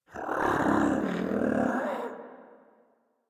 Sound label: Catbrow Combat Vocal Idle; Warframe